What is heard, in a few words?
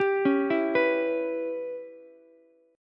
Db Minor 7th Flat 5th Db down Ukulele; arpeggiated; JGuitar